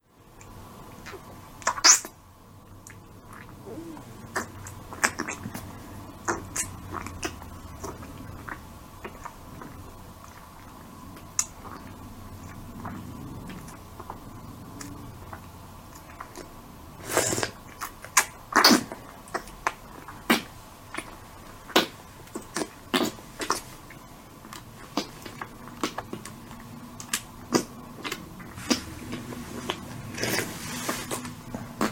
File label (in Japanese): 人妻, ガチのフェラチオボイス; チンポをしゃぶりつくす